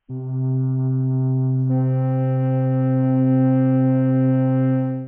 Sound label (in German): flöte c1 c2; Intervalle, die man durch Potenzen von 2 und 3 darstellen kann